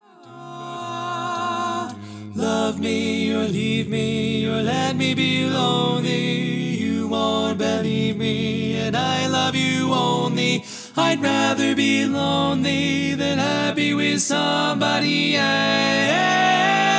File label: Tenor